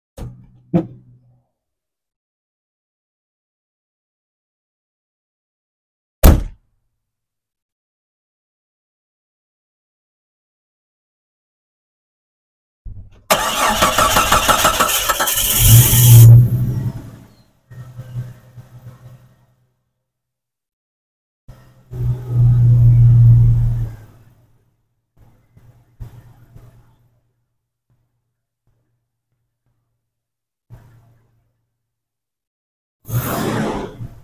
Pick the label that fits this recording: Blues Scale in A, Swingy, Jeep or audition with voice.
Jeep